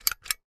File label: caulk gun pump; caulking guns